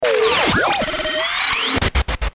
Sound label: Electro Static Accent